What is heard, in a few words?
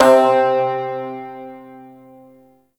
Shawzin Oct One C; Warframe